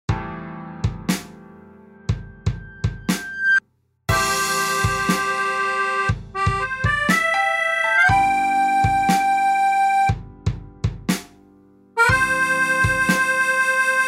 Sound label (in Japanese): passyonn-romannsu, スローテンポ; カントリー